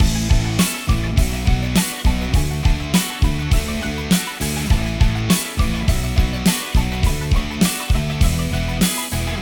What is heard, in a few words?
Pop; Sans HHHusher